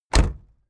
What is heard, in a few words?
car camaro close door